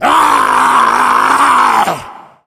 tank fire; Left 4 Dead; Source Engine

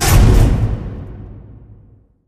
piston stop

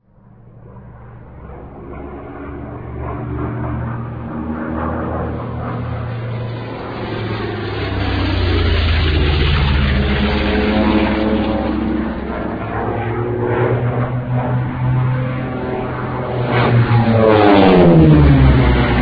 flyby; ambience; Source Engine; dod

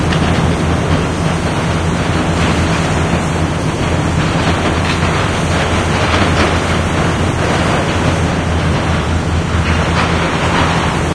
amb heavy mach lp, industrial; video games; ambient

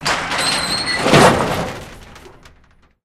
test chamber door old close

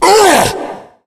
tank pain; voice; source engine; left4dead2

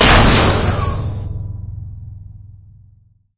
advisor pod open, ambient levels; video games; source engine; portal2; outland

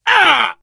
hurt major, biker; voice

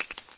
player shell; Source Engine; dod

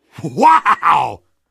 dog cheers; Portal 2; Source Engine; video games; dogfight

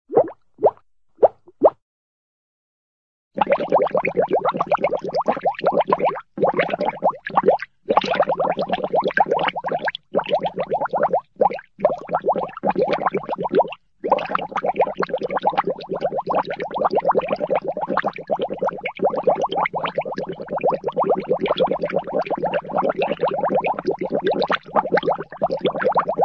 bubbles, water small water bubbles; cartoon

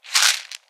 pills deploy, pain pills; player; video games; source engine; left 4 dead 2

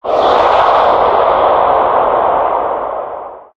male scream, halloween; Source Engine; tf

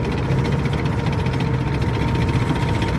taunt tank idle; video games; source engine; tf

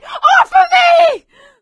scream while pounced, survivor voice; source engine; left 4 dead 2; producer

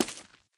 step; taunt; player; yeti standee; source engine; tf

